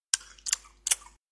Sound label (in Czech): mlask mlask mlask